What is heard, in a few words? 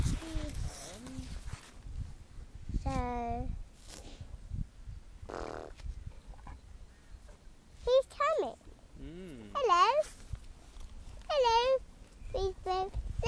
horses